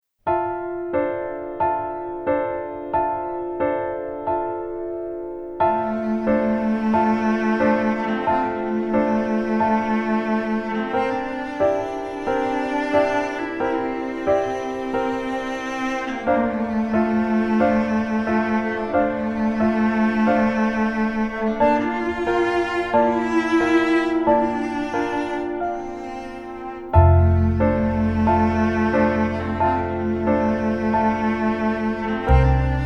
Bee Mello Cello Instrumental

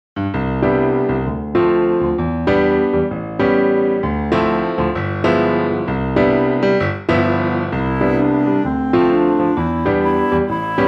Mary Had a Little Lamb Instrumental

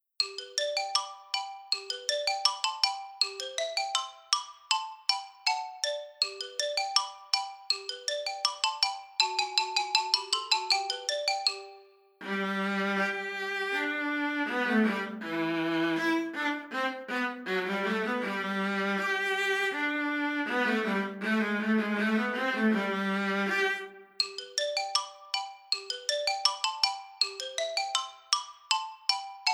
Viola Xylophone Duet Instrumental

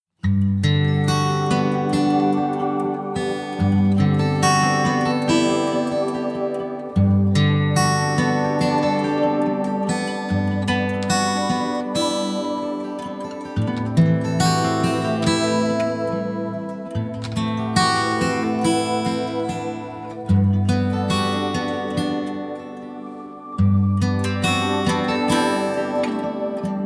Wonder Clouds Instrumental

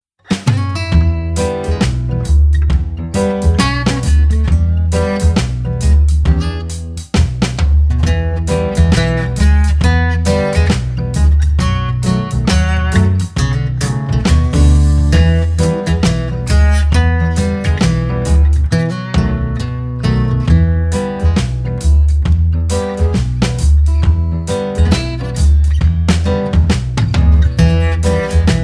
Wheels on the Bus Instrumental

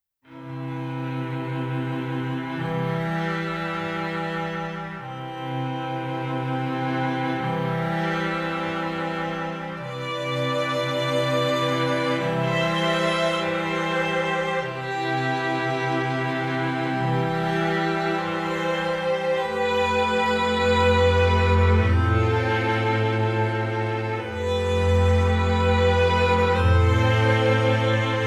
Sleepy String

